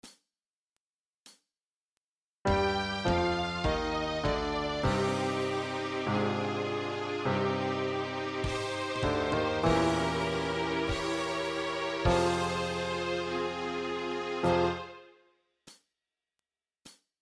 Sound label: Accompaniment